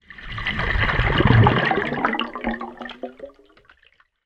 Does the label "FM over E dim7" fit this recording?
No